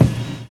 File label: live kick; Univers Sons - Kitch 70s